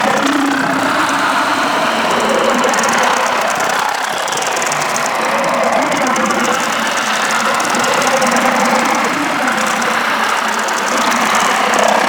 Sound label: Devour Capture Loop; Warframe; Mummy